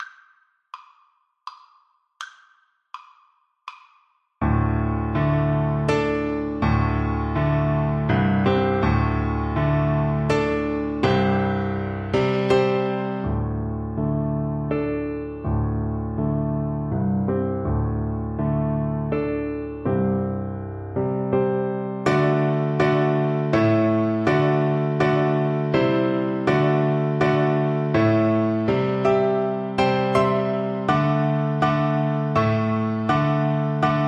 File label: blast of wind violin